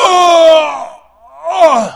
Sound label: pain, human